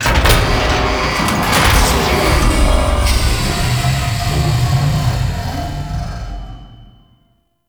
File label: Veil Factory Shutdown; Venus; Warframe; Veilbreaker; Kahl